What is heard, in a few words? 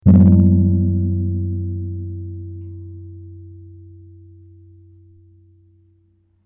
gong nipple indonesian 20 inch